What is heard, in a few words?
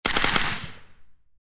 HK416 firing multiple bursts